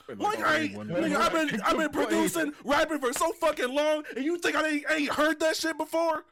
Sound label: rage